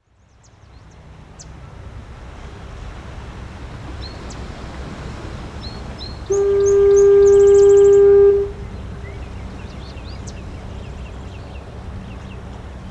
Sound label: Foghorn at Portland Head Light